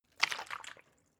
bottle water upend light; berufe

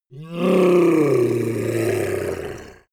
yeti growl struggle

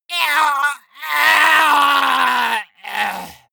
male die gurgling; soundpool

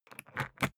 briefcase plastic latch lock key insert ringing; industrie wirtschaft; bueroarbeit

